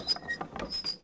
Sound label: Chariot Squeak; Civ4 warlords